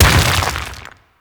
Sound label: Rock Elemental Punch Impact; Warframe; Warframes; Brawler